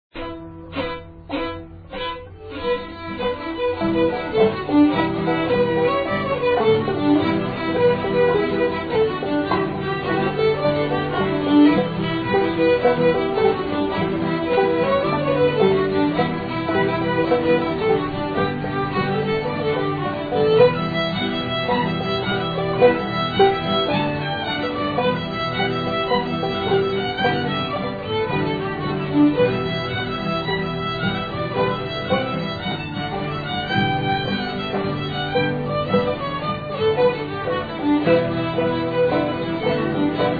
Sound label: Jig, Stringband; Morrison's Jig I